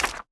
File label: Item Wheel Hover